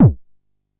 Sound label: Bassdrum, Jomox Xbase09